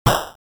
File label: 8bit card